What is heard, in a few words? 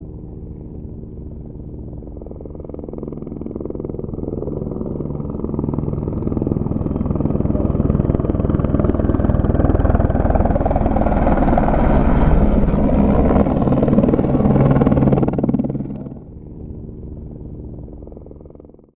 helicopter